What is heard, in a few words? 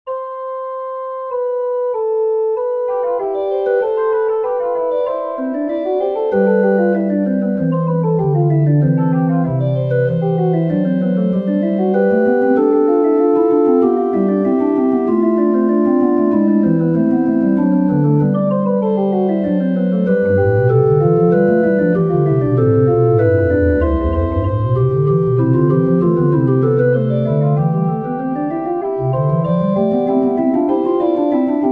prelude for pipe organ; Johann Sebastian Bach; vom himmel hoch komm ich her